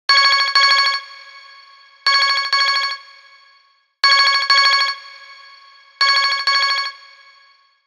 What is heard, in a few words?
ring standard